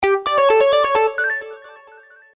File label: warbler; BenQ B502; notifications